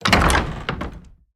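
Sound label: wood double open, door; skyrim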